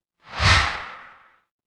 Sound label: ui swipe